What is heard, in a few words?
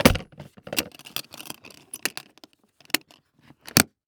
Industry Tool Tackle Box Close And Lock